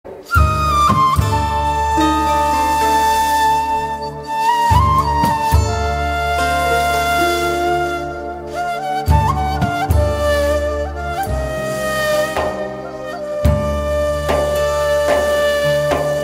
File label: Ney